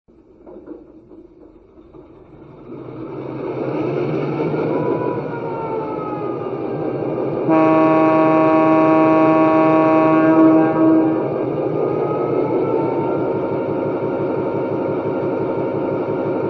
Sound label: EMD F3